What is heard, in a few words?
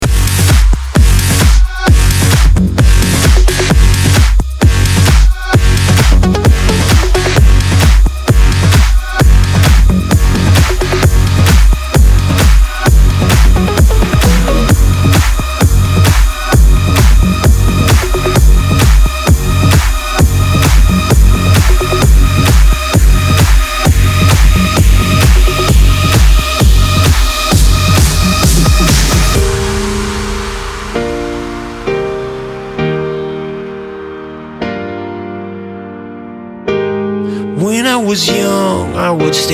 Atmosphere; BodyJam 83